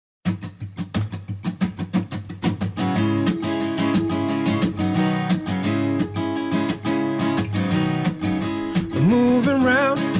Acoustic

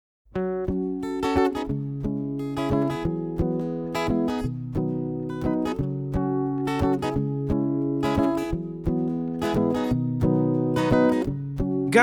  (Acoustic)

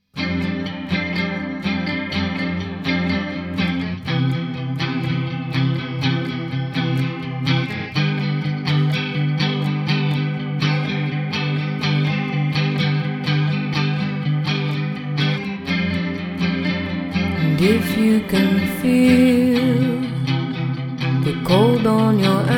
(Acoustic)

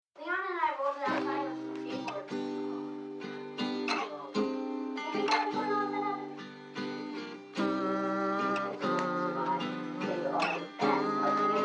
Radio Hum

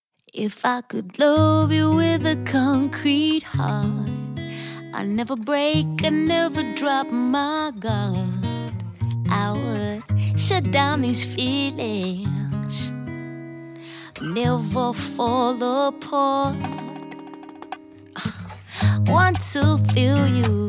Concrete Heart (Acoustic)

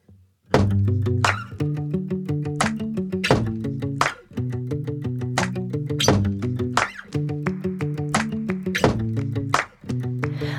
(Acoustic)

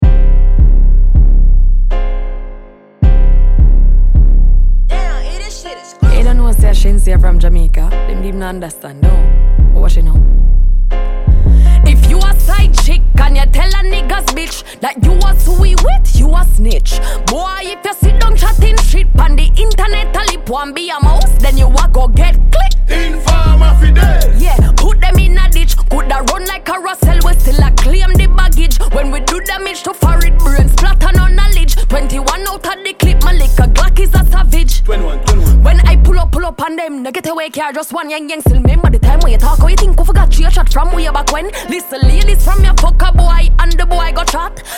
Beat Box Freestyle

